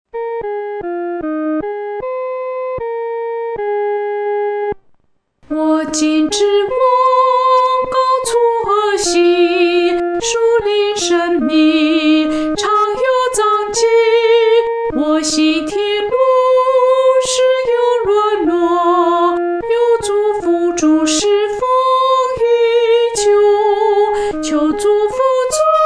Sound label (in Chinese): 独唱（第一声）